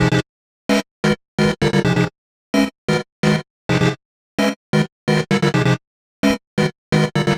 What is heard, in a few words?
Stutter Fmin 130 bpm, Synth; Loops; Tonal; Ableton Live 12 Lite; Core Library